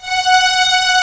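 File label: STR F#3 FF, strings; Sound On Sound - Peter Siedleczek's Orchestra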